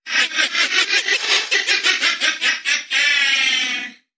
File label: Evil Toy Laugh; Say Cheese